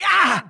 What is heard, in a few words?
hit; NWNDS; edwin